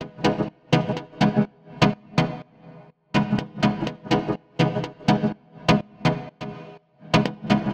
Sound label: synth loop, Dm, 124